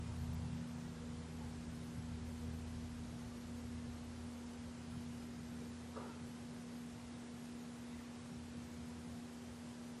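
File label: Ballroom Static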